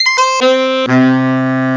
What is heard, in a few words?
Saxophone; instruments